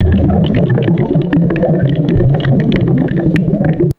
rapid dull underwater coral impacts

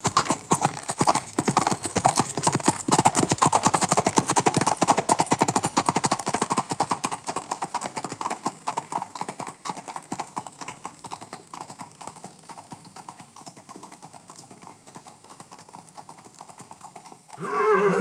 horses walking away on gritty surface; footsteps

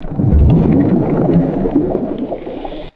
muffled underwater slosh with bubbles; ambience

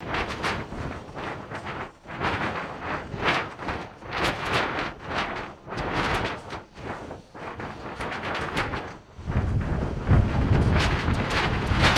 large plastic sheet flapping in strong wind

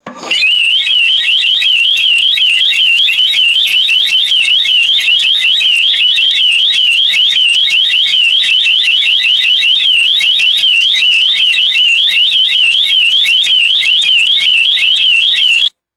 rapid electronic merchandise alarm chirping; alarms

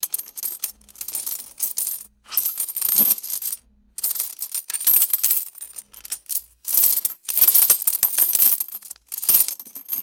metal coins jingling in a jar

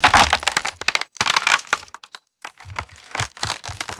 small wooden structure cracking and crushing

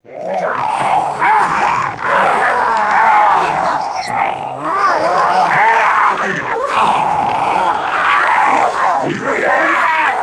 chaotic mob of evil creatures growling

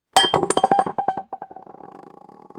glass bowl set down and wobbling

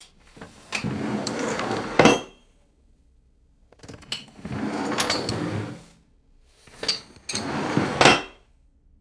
rummaging through utensils in kitchen drawer

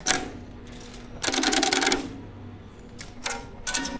rapid metallic telephone relay clicks; mechanical

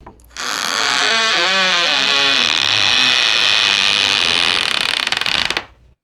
long high pitched wooden creak

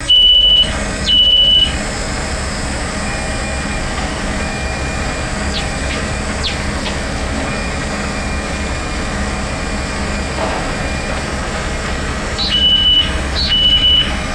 rhythmic warning beep over machinery rumble; ui

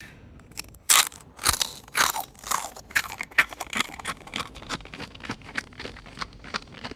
close up wet crunching and chewing ice

